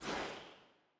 ambience room shadow room assassin block open

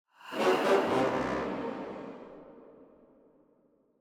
ui fast battle lose; Shelter

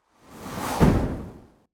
npc oracle strike

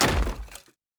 environment object barrel breaking

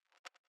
zap

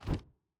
environment mob release all

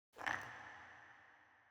ambience room necromastery rock

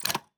object open chest

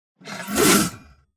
succubus strike; Shelter

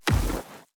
npc tree golem big projectile

